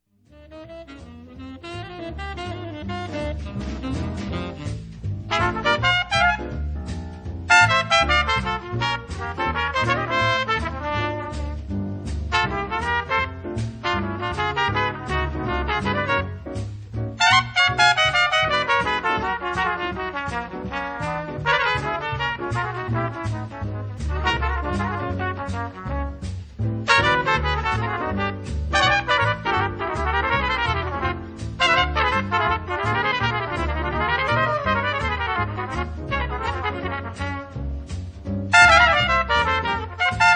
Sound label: Duet Part Added; TRUMPET